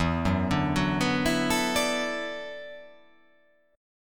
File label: E 7th Suspended 2nd Suspended 4th, down